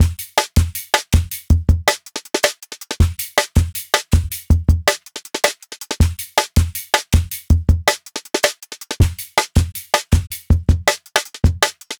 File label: 160 Live Jungle Drum Break; PETERRIVIERA